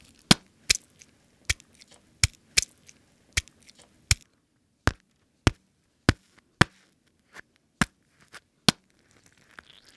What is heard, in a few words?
Stabbing And Tearing Flesh